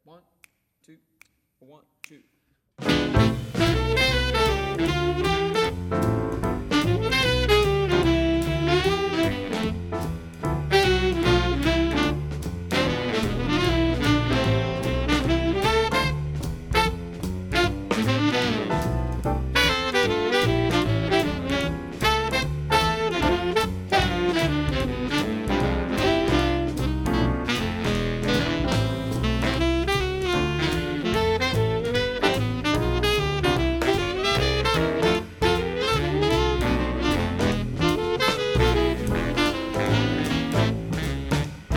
Jazz Sax; 2019 All State

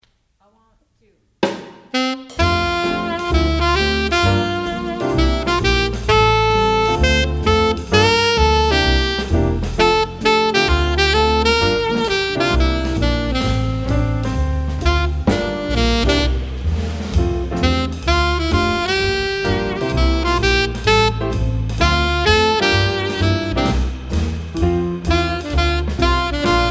Alto Sax; all state jazz etude recordings

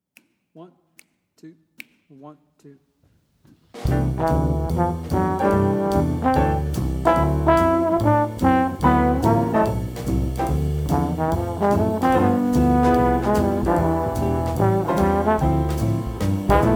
Trombone

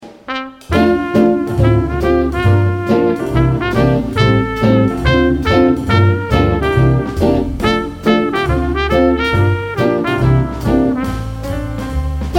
guitar; all state jazz etude recordings

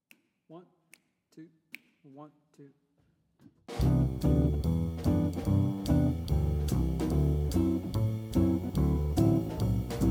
Piano Accomp; 2024 Piano Accomp; Jazz Combo

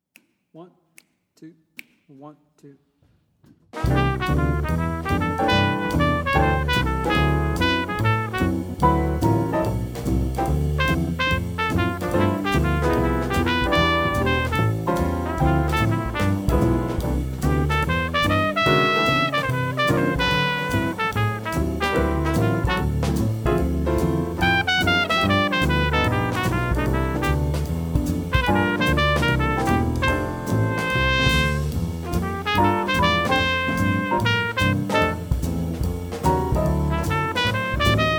Trumpet

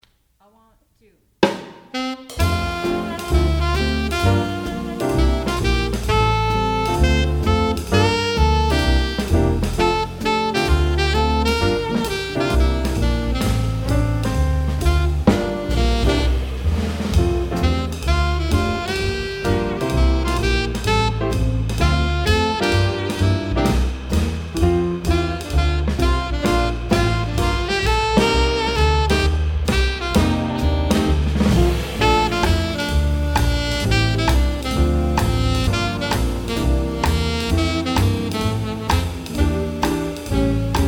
Drums; all state jazz etude recordings

